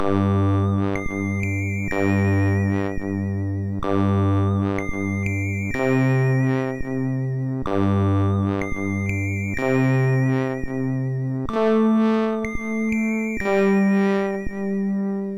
Hires Demo Kit, MOS6581R3

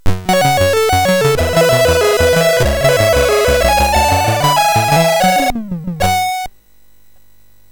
sid MOS6581R3, GAMES; Continental Circus

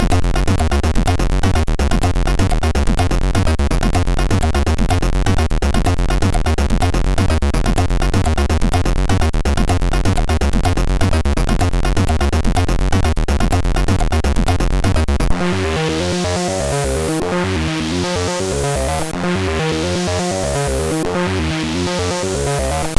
Hard Break, MOS6581R3

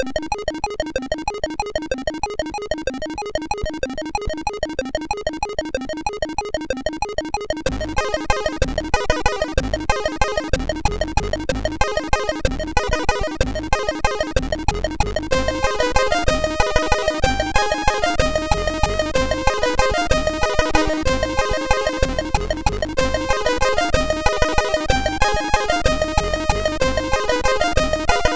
Fairground, sid MOS6581R4

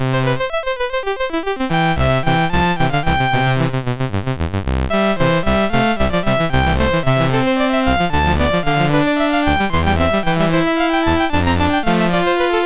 Sonata No5 for Organ, MOS6581R3

